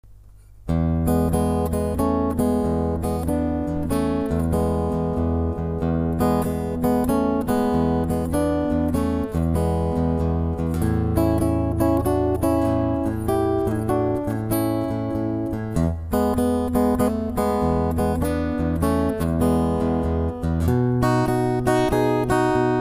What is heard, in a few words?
warm up blues